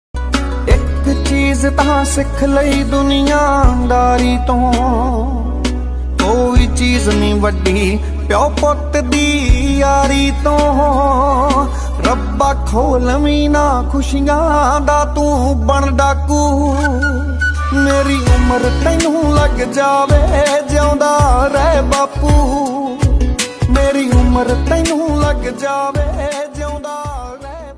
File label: new punjabi ringtone song ringtone new punjabi sad song ringtone